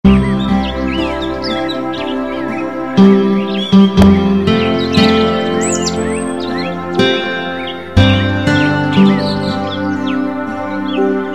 tieng chim hot hoa tau

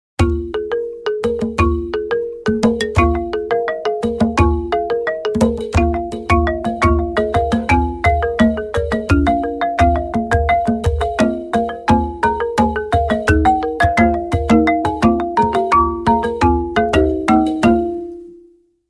Tây Nguyên hòa tấu

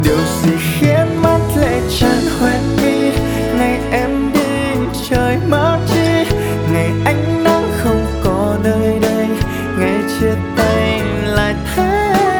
Nhac Chuong

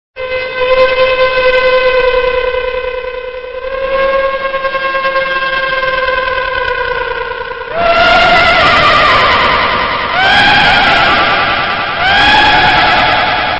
am thanh kinh di

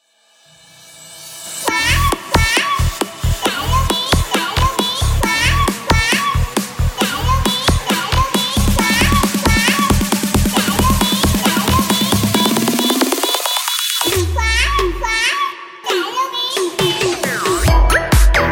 Nhac chuong Khoai khoai Chay nuoc mieng remix TikTok

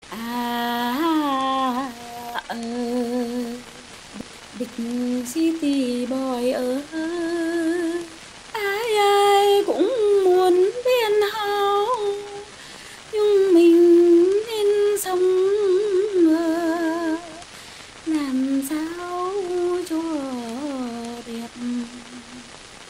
phien ban me hat ru con ngu

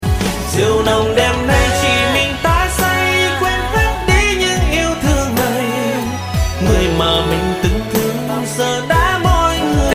nhac chuong

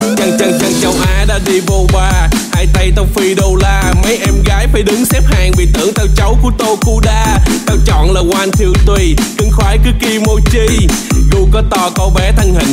nhac chuong remix

